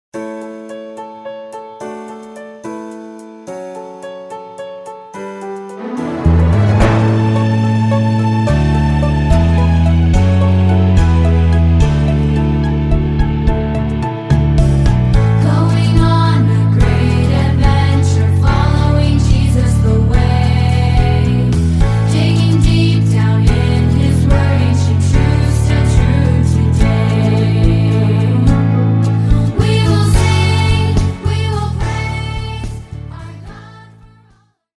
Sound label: Contemporary Vocal